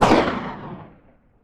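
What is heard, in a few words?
distant shotgun exterior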